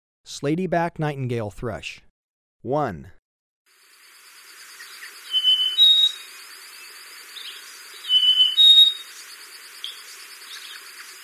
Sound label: Slaty-backed Nightingale-Thrush, Birds of Ecuador; S. America